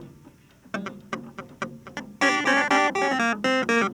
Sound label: Clavinet, 70s Analog Funk; Sonic Foundry (Sony Creative Software); What it Is! 70s Analog Funk